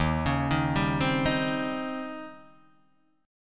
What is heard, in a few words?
Eb 13th, Guitar, Open D